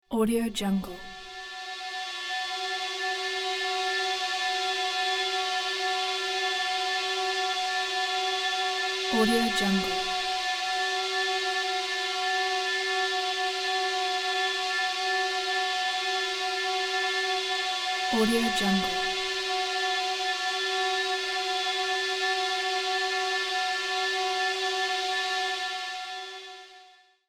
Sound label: psycho syren atmosphere